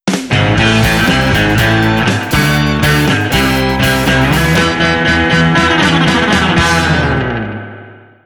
Sting Win (A)

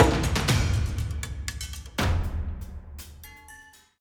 Transition; Skyway

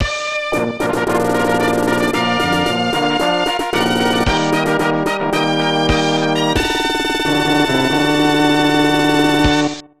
Race Start Fanfare

